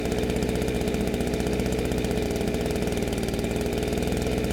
leaf blower engine ext low rpm loop side perspective stereo xy; fvtt sonniss gdc audio